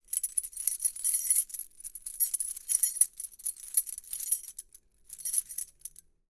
sennheiser mk 8 schluessel

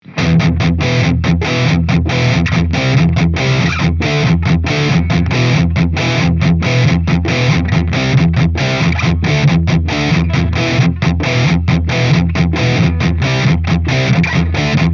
esp ltd vulture tiefes riff bridge pu; james hetfield signature

